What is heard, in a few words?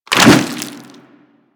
Infested Ancient Swing; Warframe; Puss Ancient; Attacks